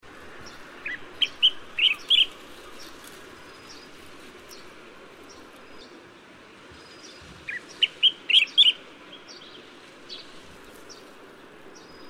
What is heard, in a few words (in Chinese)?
白環鸚嘴鵯; 領雀嘴鵯、綠鸚嘴鵯; 鵯科/留鳥